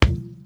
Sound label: placing Metal; voxelverse